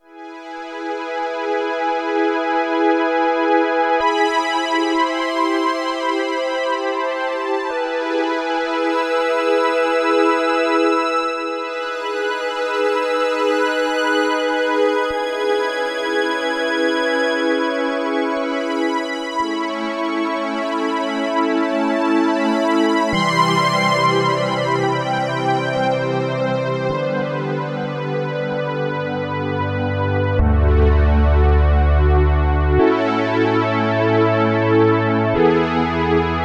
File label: Strings, Roland JX-8P